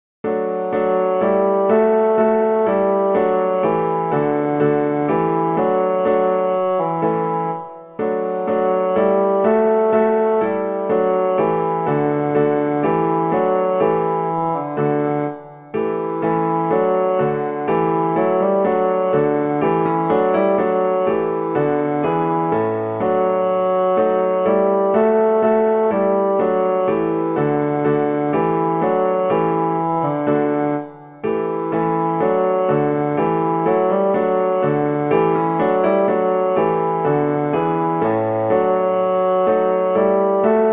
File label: bassoon piano; beethoven symphony9 4 ode to joy